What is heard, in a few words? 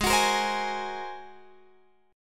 G sharp 9th G sharp Guitar Open F; G#9; strummed